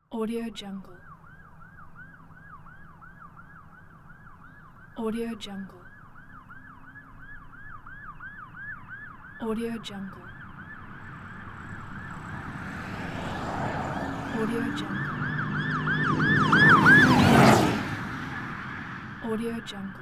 police with siren speeding by

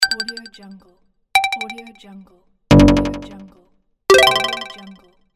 game points

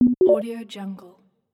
notification element

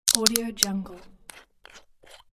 opening the lid

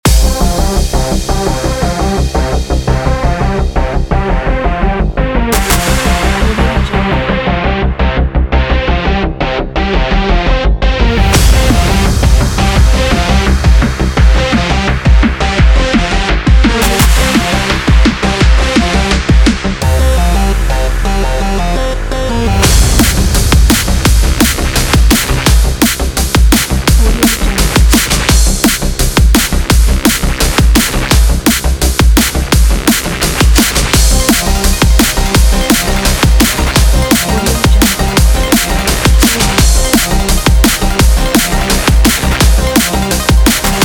breakbeat

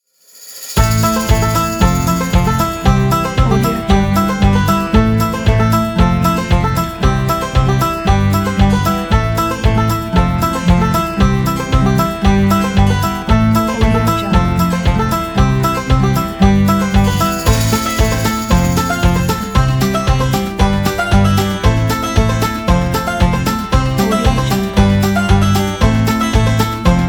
the western landscape

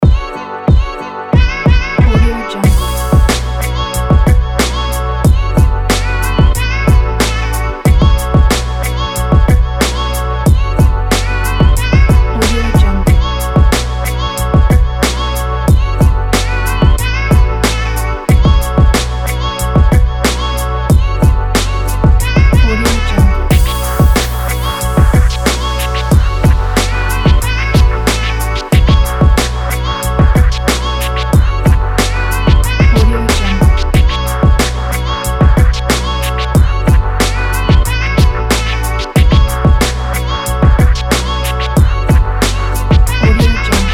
vlog kit

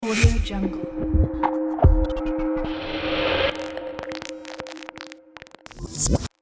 glitchy intro logo

